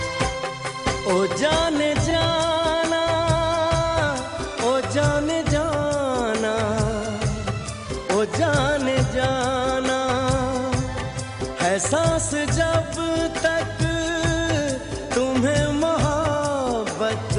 Hindi Classical Song Ringtone

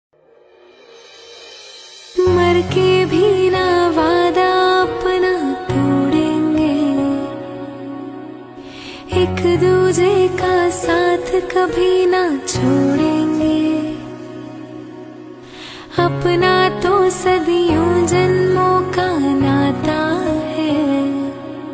New Female Version Sad Song Ringtone

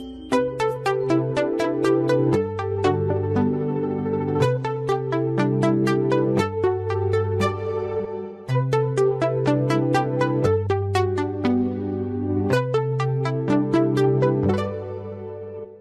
Samsung Galaxy J6 Ringtone

